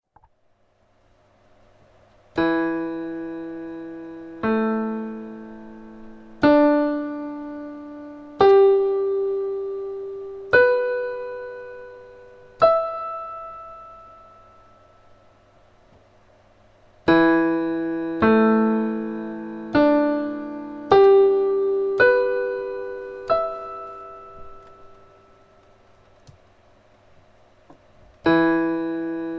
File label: piano